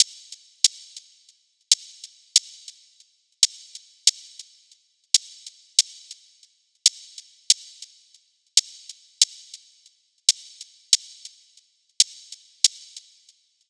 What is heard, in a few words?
Hi-Hat Loop, 140; Jungle Boy